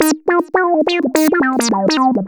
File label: lead (brass)